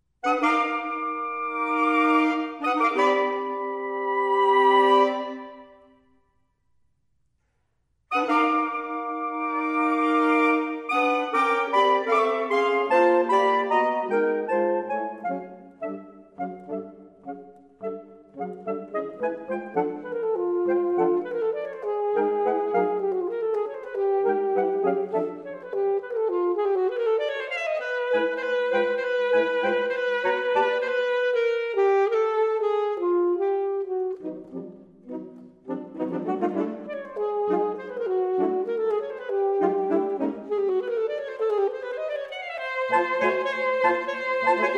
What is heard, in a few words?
Saxophones Fast Music